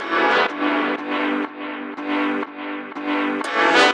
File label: Music Groove 122 Bpm E